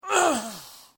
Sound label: Human Death Male Dying Sigh Grunt; LYN116 package of human sound effects